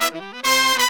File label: MERENGUE